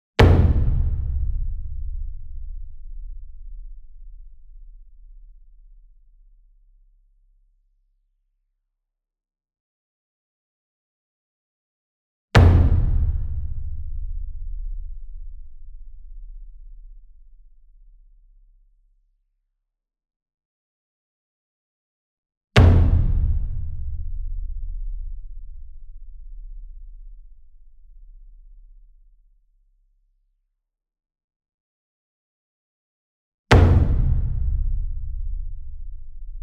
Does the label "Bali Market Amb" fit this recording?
No